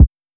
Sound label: Kick